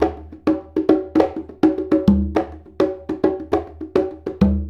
Congas; Essential Percussion

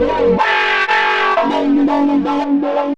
Lead; neuro; InsideInfo

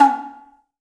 Tsuzumi Lo, Percussion; Roland BK-5

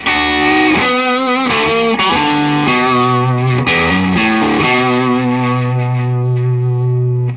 SOLO, Vintage Blues Guitar; USB Soundscan vol.22; AKAI; SOLO B125

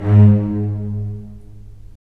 STR, LA Composer; Roland - LA Composer vol. 4; Full Short

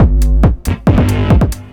drum 138, Techno, drum-loops

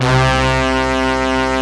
BRASS C2; FUNK

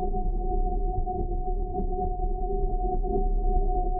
Tonal Re Draw, sparse soundscape

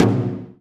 SMALL DRUM, JAPANESE

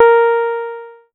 A#, PIANO; Big Fish Audio; Synclav FM

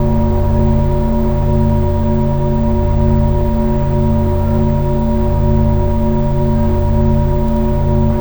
Drone Ambient; Drums & Drones

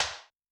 Ana Bz Rim, Yamaha DTXpress-II snare

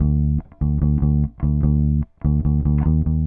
Bass, Indie Rock

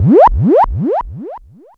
ECHO FX 2, ANALOG ECHO; Zero-G Ambient 1

